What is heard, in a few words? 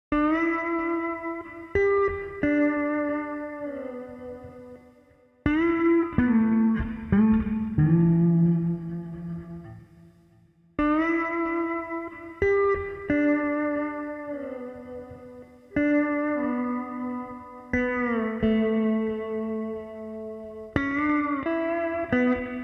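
Street Guitar Slide Cool Down, 90 bpm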